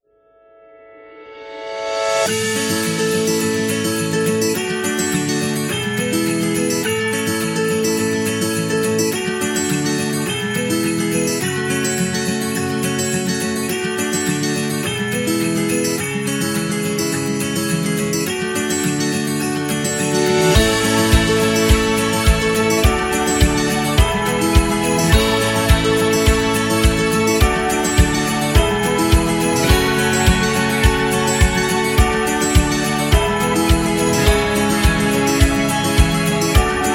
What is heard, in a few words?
Christmas Background